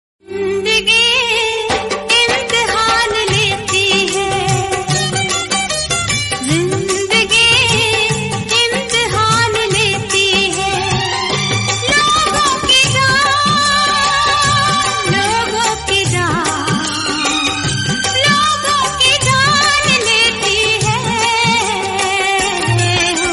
sad hindi old

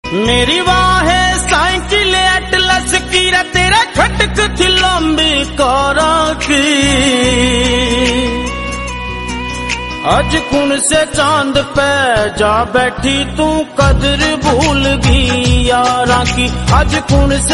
haryanvi song

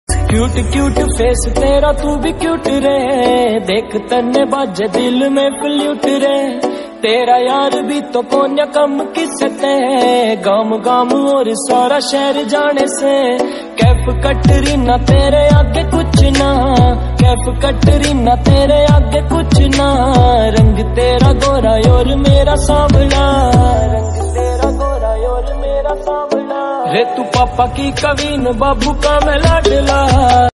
flute

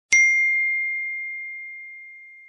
iphone notification tone

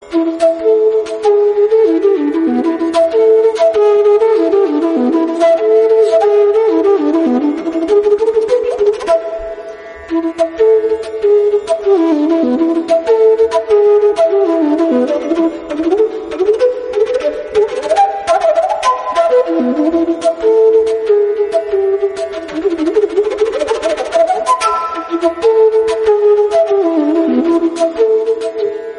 flute cover instrumental bgm

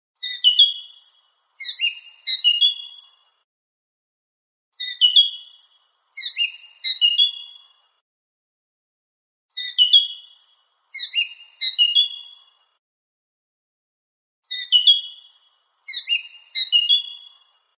grey francolin bird sound

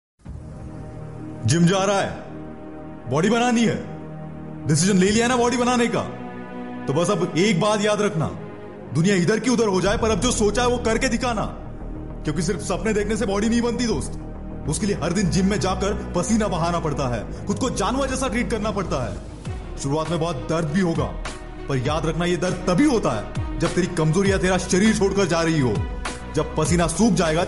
motivational hindi

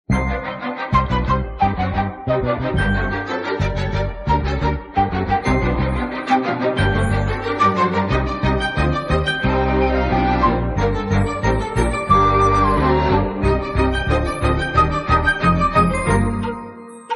instrumental tamil; pa paandi

